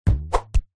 cartoon arrow release bow whoosh past hit target thud